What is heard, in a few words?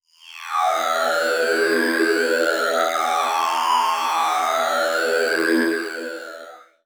ELECTRO PAD 140 A